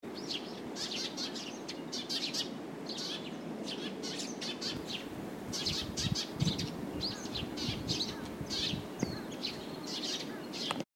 tori suzume

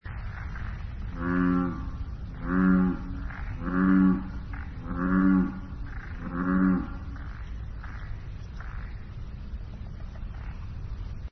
ushigaeru, kaeru

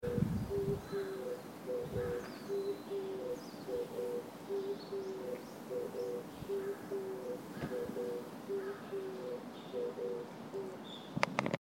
tori kijibato

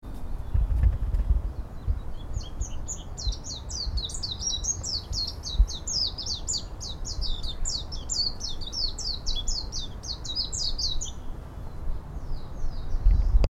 tori mejiro

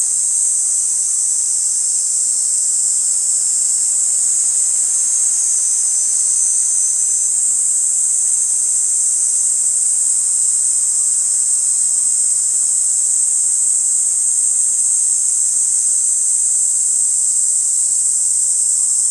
semi niiniizemi

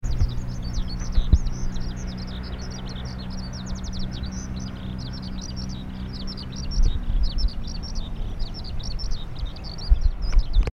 tori hibari

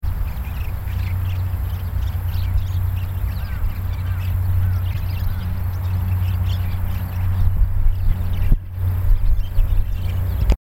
tori mukudori

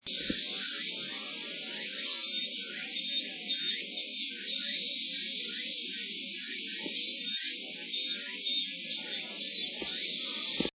semi minminzemi

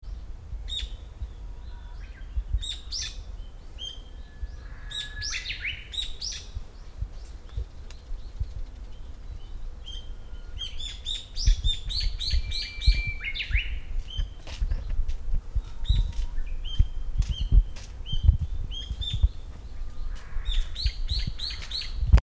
tori hiyodori

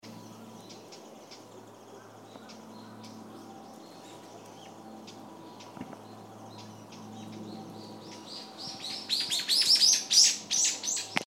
tori uguisu

